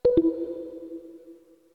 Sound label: cancel